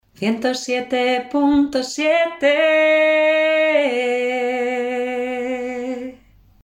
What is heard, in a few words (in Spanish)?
grabacion dial, jingle cantado del dial 107.7; cantante; cantante, spanish voice over